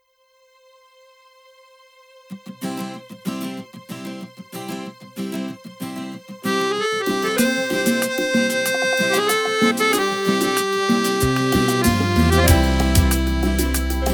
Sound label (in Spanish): Cumbia